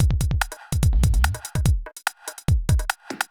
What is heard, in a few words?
fast break 145